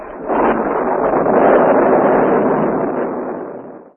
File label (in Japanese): bakudann